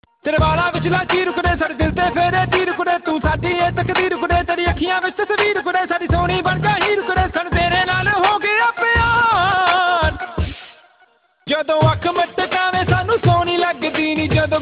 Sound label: Punjabi Song